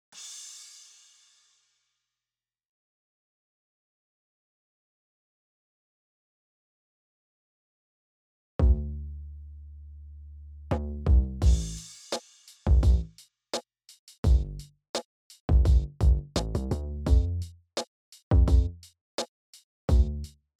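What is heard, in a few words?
AirEQ Premium Rhythm Bass EQed; eiosis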